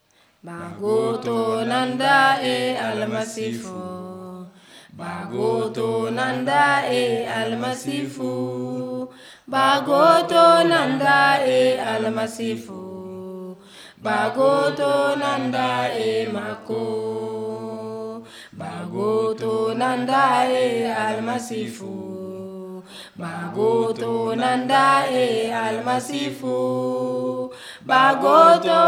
song chanson western fulfulde; fulfulde adamawa; bible stories; abc nt drama

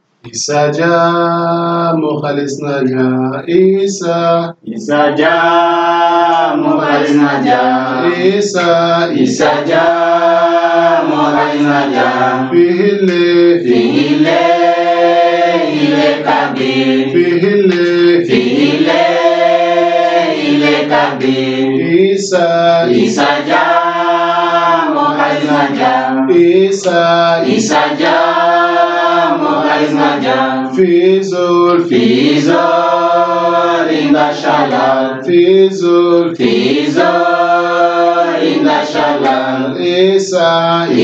chadian arabic songs; issa dja jesus healed the paralytic

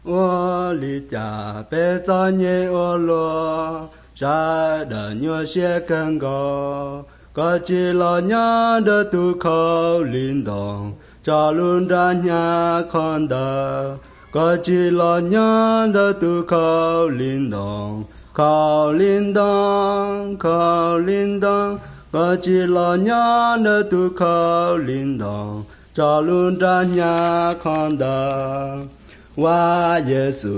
songs hmong white

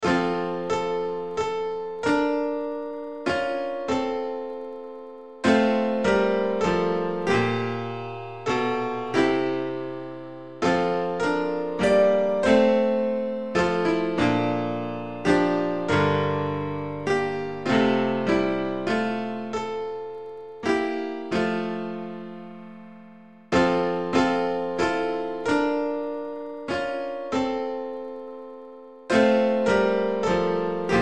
instrumental hymns; breathe on me breath of god

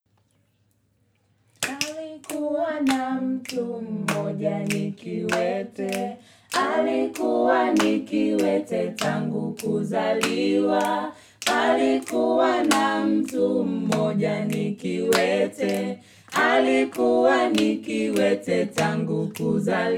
song, swahili; swahili tanzania; bible stories

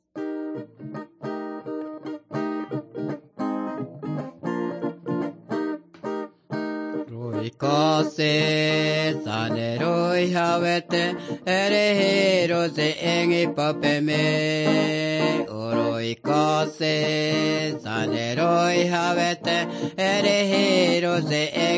singing to god; guajajara; wycliffe bible translators inc nt drama

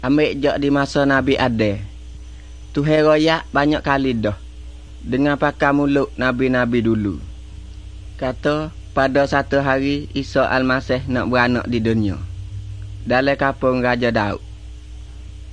songs malay pattani

